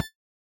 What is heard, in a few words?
arcade bodyshot